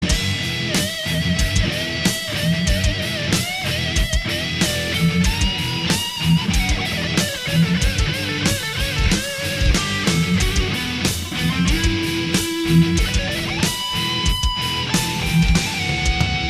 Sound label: Harvester Solo